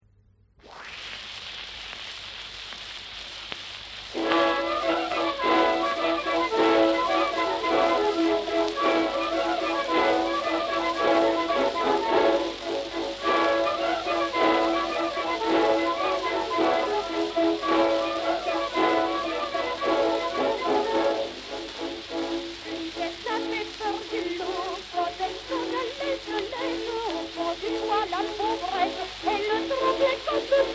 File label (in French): Fonds sonore